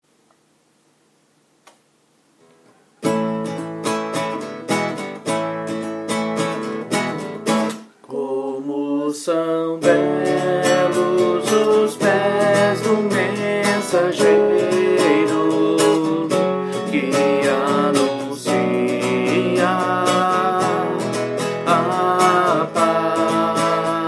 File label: vocal